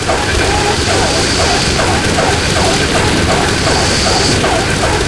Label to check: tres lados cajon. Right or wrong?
Wrong